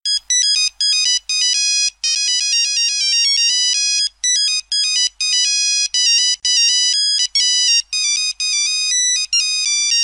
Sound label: Nokia 3310 signature ringtone